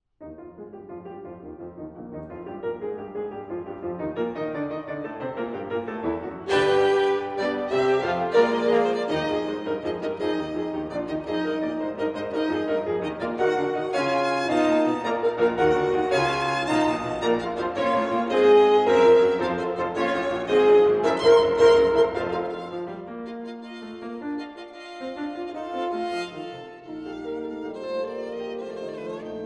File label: Trio For Violin, Horn & Piano in E flat Scherzo